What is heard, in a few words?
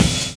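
deep reverb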